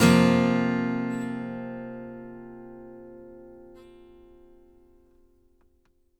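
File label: acoustic guitar F sus up, Whole Lotta Country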